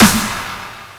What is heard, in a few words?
Clap Slash